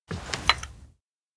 coffee hand; anemoi

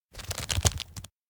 fist clench; anemoi

